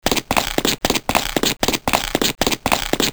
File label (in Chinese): 跑步